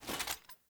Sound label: Foley Action Armour Walk; Player; Mother Armour